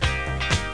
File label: Disco - Default; discotheme